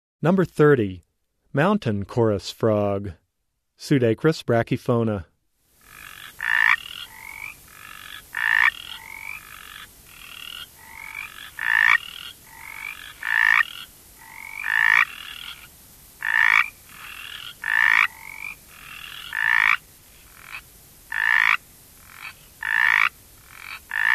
Pseudacris brachyphona - Mountain Chorus Frog, Frog Calls; Herpetology; Bio227 - Herpetology